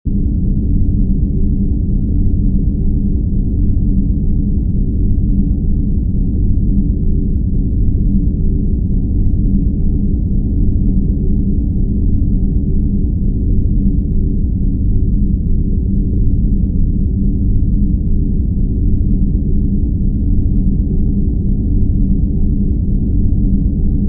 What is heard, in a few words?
Underwater High Pressure Loop; Ezra The Stranger; By Sliflexstudio